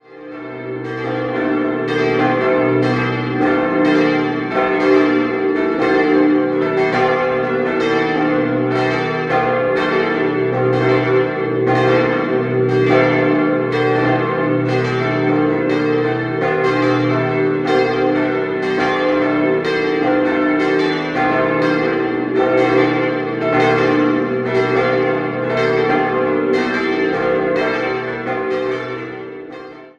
Hohenegglkofen, Glocken

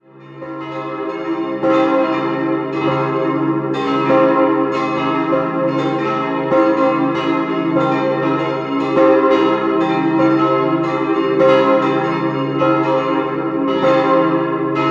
Pfronten, Glocken

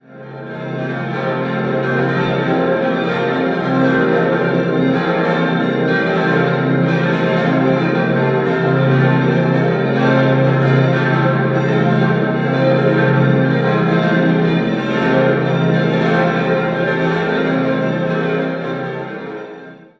Wartberg an der Krems, glocken

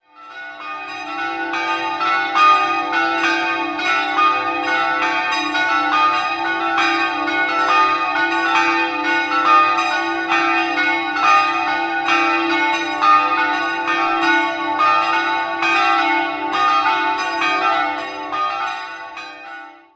Hausen, Glocken

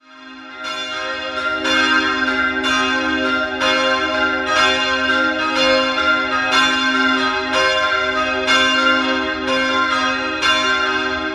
Unterbuchfeld, Glocken

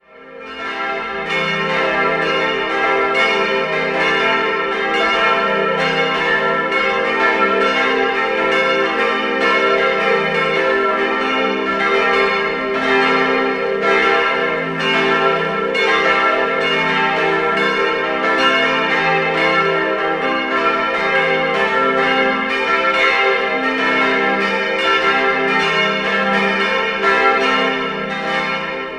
Nürnberg Mögeldorf, glocken

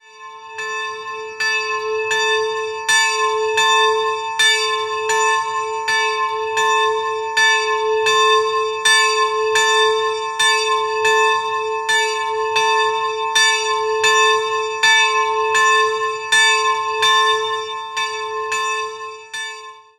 Köstlbach, Glocken

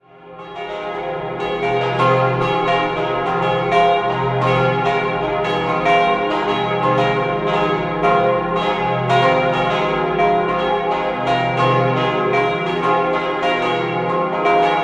Hohenpeißenberg Pfarrkirche, Glocken

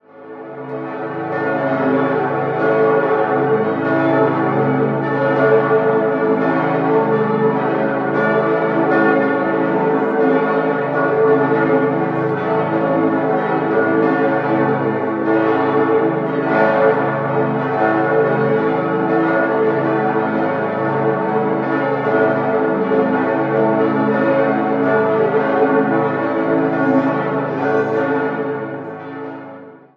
Schwaebisch Hall, glocken

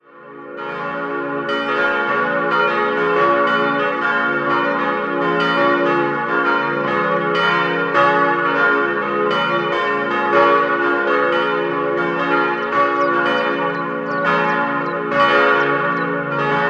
Unterköblitz, Pfarrkirche, glocken; Pfarrkirche St. Josef; 4-stimmiges Salve-Regina-Geläut: des'-f'-as'-b'; Die Glocken goss Rudolf Perner in Passau.

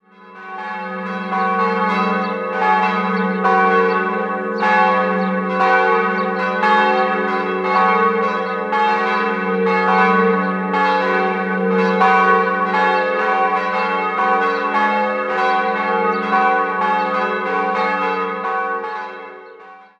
Ebermannsdorf, Glocken, Pfarrkirche; Anfang der 1980er-Jahre; in Passau gegossen; Bruder Konrad; Perner

